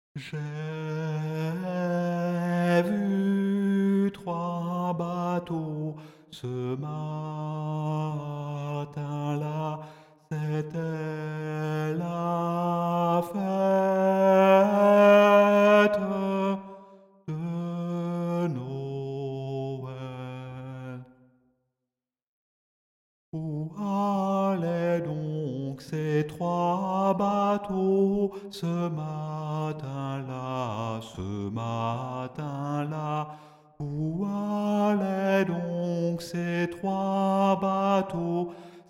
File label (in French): noel alto